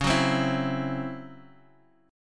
Db Minor Major 7th Double Flat 5th Db down Guitar Half Step Down